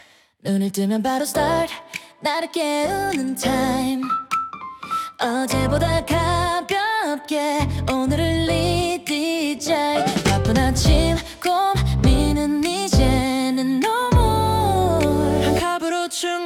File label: morning routine campaign song K-pop version; 21days-challenge